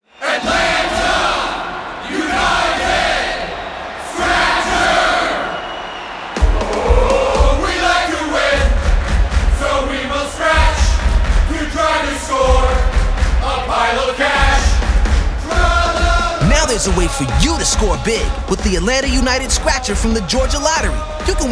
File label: Chant; ATL UTD Scratcher